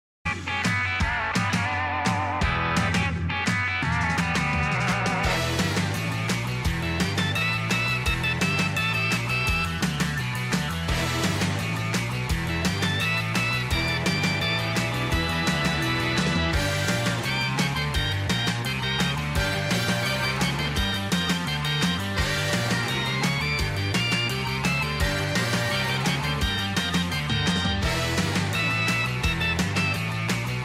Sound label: surf rock with organ and claps